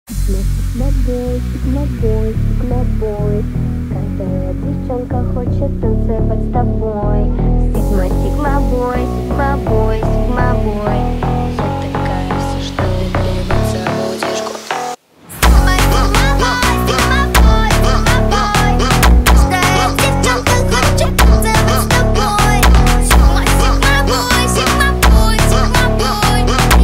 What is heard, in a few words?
brazilian phonk remix